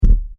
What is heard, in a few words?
Bang On The Door, Door Knocking; Door Knocking (Sound Effects:Doors)